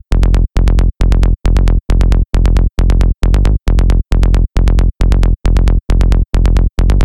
Trance Psytrance

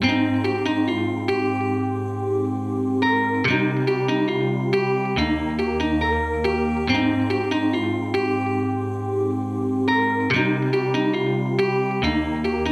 Guitar

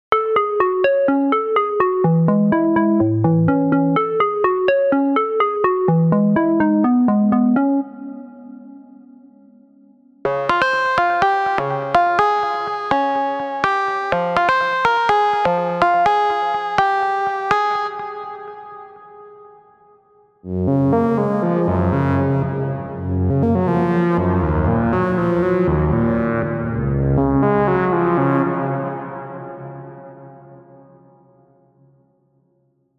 Melodies House

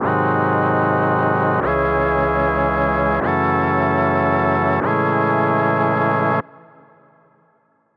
Chords Full Trap; midi wizard

